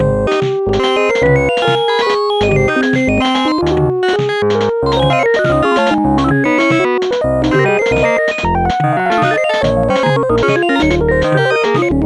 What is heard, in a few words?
jazz waltz; Instant Music